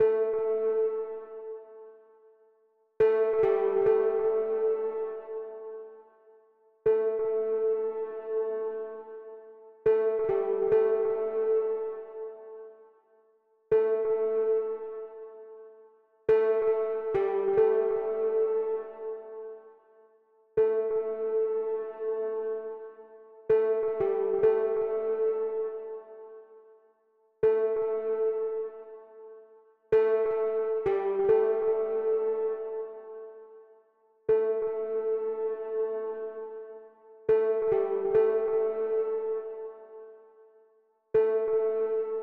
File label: Old VHS Tape Synth